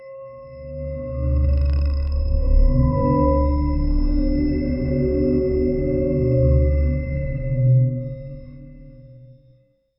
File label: slowing spinning sound; draining